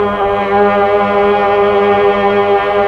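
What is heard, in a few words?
Pad Tron Violin & Choir G#3; Tron Str+Vox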